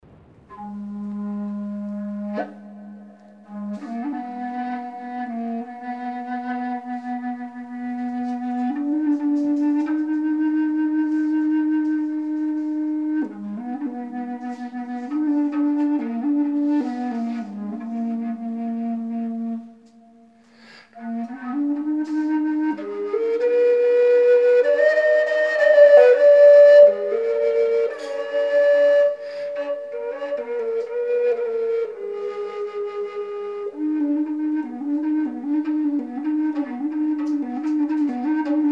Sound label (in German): Moldavische Kaval Gis